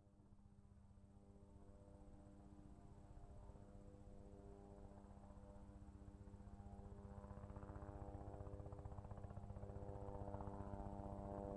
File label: Helicopter fast fly over